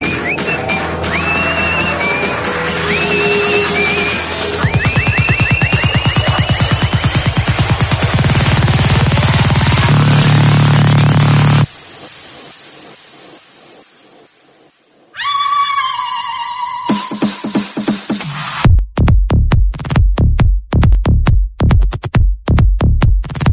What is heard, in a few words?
Whistle Psy Trance Bgm